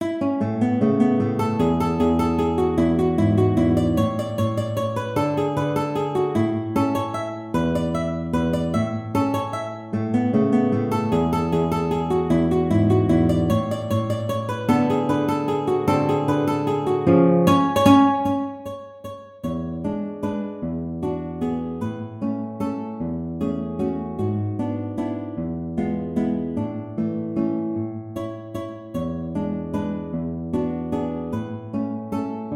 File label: Waltz, guitar